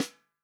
REAL DRUMS FUNK KIT

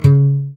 GUI AC, Acoustic Slap, Roland; Sound & Vision; Gigapack I CD 2

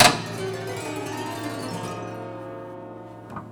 Prepared Piano; Battery 3 Sample Kits

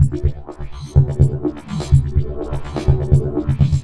Processed Perc

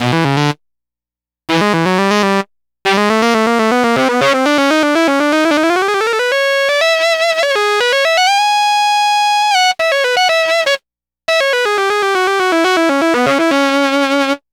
Sax Line; Dr. Fink's Funk Factory

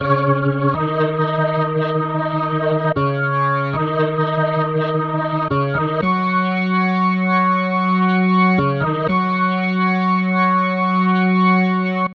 Organ, cinematic funk; Sonic Foundry (Sony Creative Software); Cinematic Funk Urban Tone Poems

